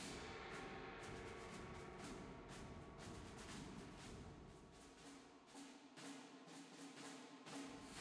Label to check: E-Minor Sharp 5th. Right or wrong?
Wrong